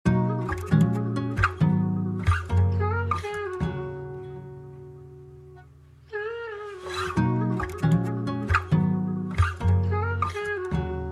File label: 135bpm F major